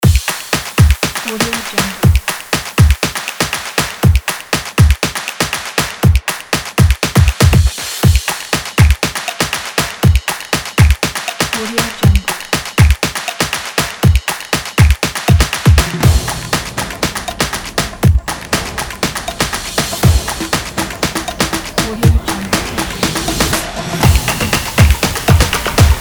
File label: percussion; fassounds